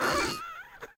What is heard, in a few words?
Monster Cry